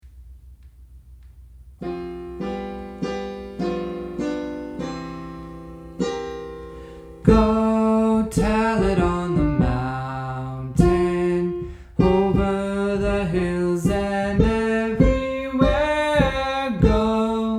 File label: Go Tell It On The Mountain Soprano